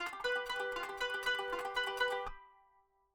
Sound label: kanun